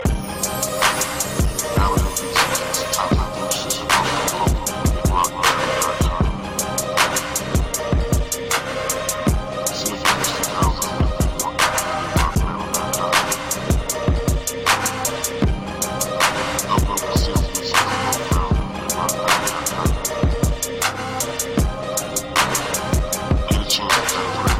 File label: hiphop remix